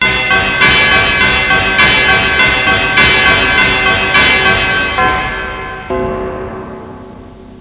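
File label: BELL CHIMES; The Adventures of Ninja Nanny & Sherrloch Sheltie; No 11 Downing Street; MSDOS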